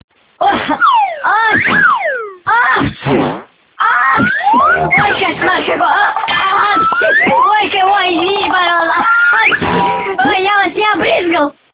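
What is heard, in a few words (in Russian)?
Apchhi, sneezing phone; Prostivshxj telefon; Golosovxe Otkrxtki; S dnem Rozhdeniya; Prikolqnxe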